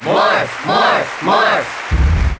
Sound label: Cheer NTSC; Marth; Melee